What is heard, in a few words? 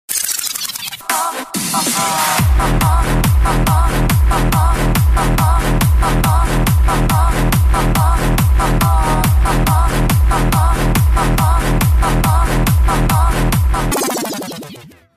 Electro Mix